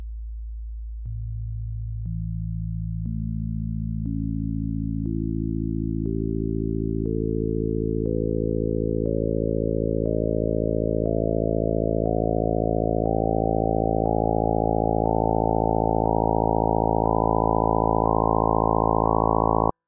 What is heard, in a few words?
harmonics; harmonie